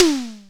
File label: TOM, Simmons SDS5